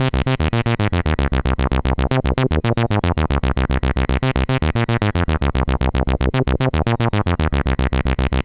C64 Bass, Commodore 64, Chiptune; 8-Bit

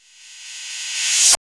CHZ CRSH RVRS, SYNTH CYM; Drums In Your FACE!

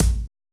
kick, Roland MC-909

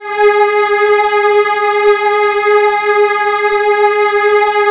Virtuoso Strings, edgy, G#3; ILIO; Kirk Hunter Virtuoso Strings VOL-2; 90 s Sample CDs

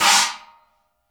CHOKE, cymbals; Sampleheads - New York City Drumworks VOL-1; SW CYMBALS

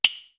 CASTANET; East West - Global Sounds

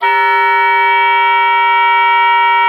OBOE3; Xsample VOL-6

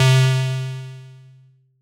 SYN, Synths; M8; Electronisounds

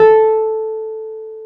PNO, Wurly

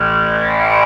FX Dance

